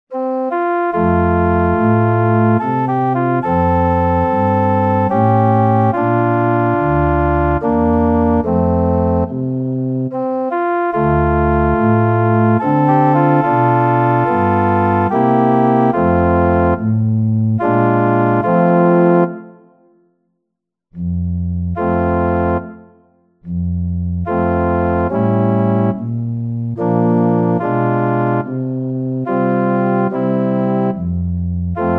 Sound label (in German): Amazing Grace (Fagott Solo + Orgel-Playback)